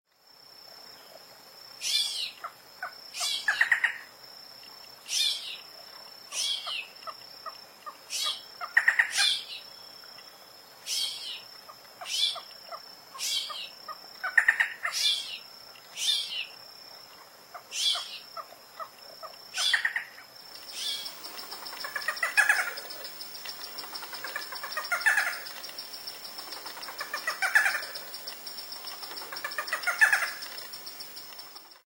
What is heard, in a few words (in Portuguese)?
Canto do falcao cabure